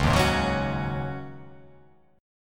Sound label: C sharp Suspended 2nd Suspended 4th, C sharp